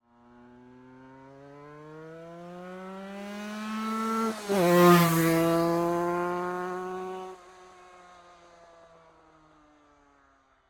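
Vehicle Moto Aprilia RS 125 Exterior Pass By Fast Accelerating Gearshift, Sport Bike; 1998; MKH8040-MKH30; Dramatic Cat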